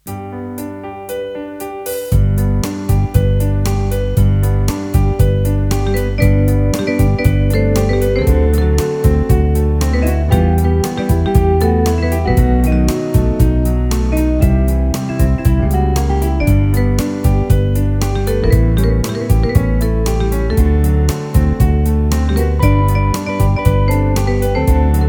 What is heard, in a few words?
Piano Ballad